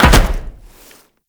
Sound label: Land Heavy Metal, Footsteps; Warframe; Tenno